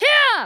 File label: kiai; MonsterHunter; Fight